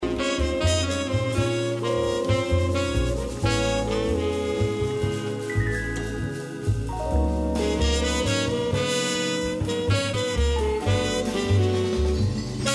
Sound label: jazz